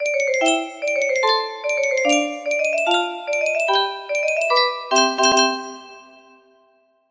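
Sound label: notification, music box melody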